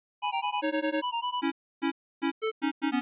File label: battle theme; octopus city; oppressed humans